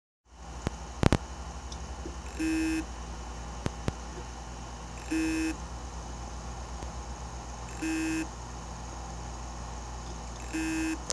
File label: Seagate Seized Spindle Motor